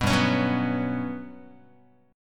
G Major Sharp 11th G down Guitar 4A 4E 5A 5D 5G sharp 6C sharp; strummed; JGuitar